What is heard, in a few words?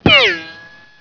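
ricochet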